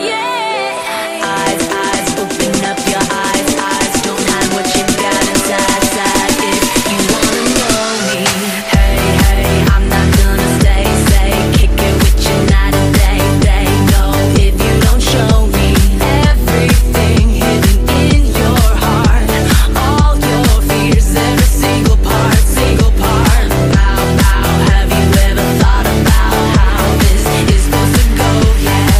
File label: Dance Hall